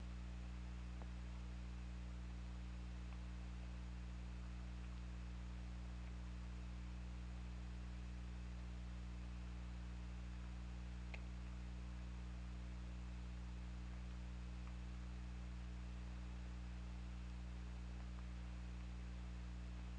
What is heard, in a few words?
Orca; OrcaLab